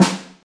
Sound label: Fluffy Snare Single Hit F# Key, snare drum tuned to the F# note